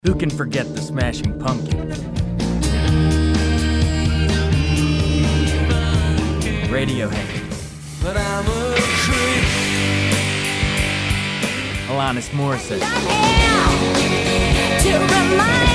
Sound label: 90s ALT ROCK